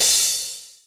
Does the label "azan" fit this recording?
No